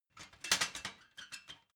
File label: ladder metal handling